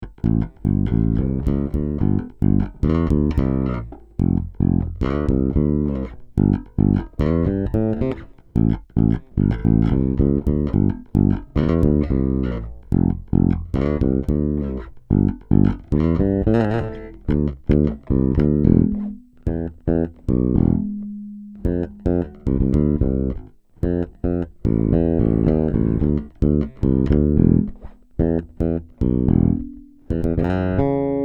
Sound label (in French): C Passif Tone 60, basses, Sadowsky NYC 5